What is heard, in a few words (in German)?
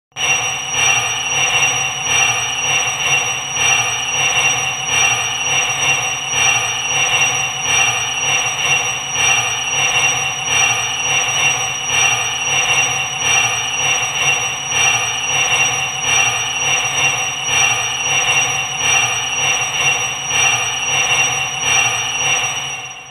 Ei auf Glas